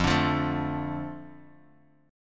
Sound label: F 6th F Guitar DADGAD; 3,5,3,2,x,x